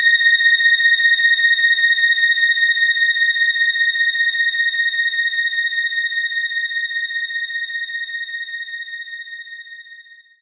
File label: Synth Strings C4; knights try